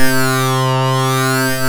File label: ARP Synths, LFO SYNC C1; E-MU Formula 4000 Series Vol. 3; Analog Odyssey